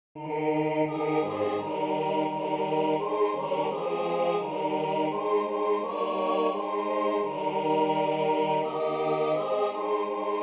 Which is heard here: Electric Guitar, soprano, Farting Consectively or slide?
soprano